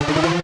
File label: choice Up; itgmania